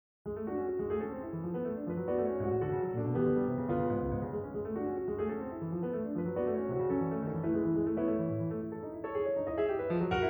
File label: The Slow Binaural Chime; Pro Sounds Effects Library